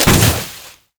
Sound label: minefield; LoL; ziggs poolparty